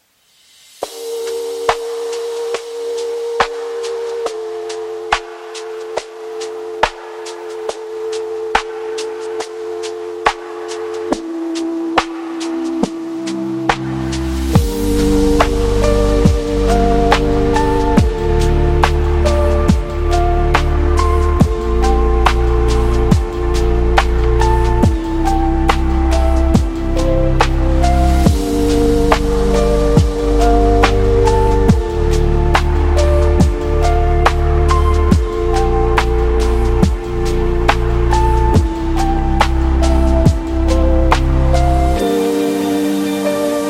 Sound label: Ambient Beat